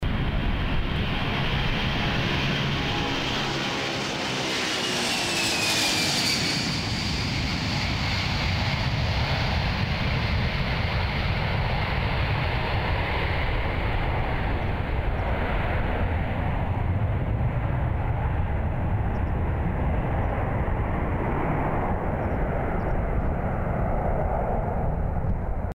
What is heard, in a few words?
sofia takeoff